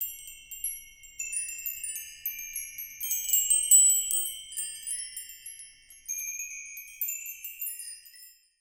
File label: RANDOM, TREE BELLS; Voice Crystal - Steve Reid Definitive Percussion